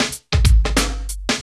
90 fills, fills & perc; Sound & Vision - Gigapack I

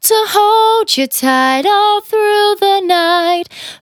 Finally 70bpm C, Velvet Vocal; Zero-G

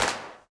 Clapper, snares; RoBKTA Sample Pack Supreme

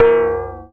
A#, Synclav FM PERCUSSION; Big Fish Audio

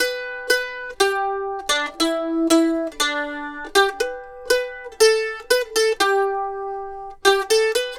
Mandolin Lead; Appalachian; Continental Drift Aftershocks

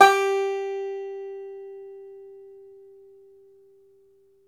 FLAT TOP, Flat Top Banjo

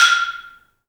balambo, balafon; Heart of Africa

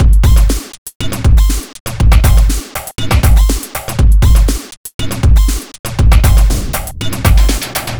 dent 120bpm

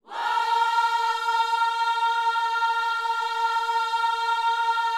WHOA A#4, Vocal Planet, Gospel; ILIO

